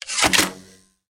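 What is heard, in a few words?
sharp ringing sound of an arrow hitting the target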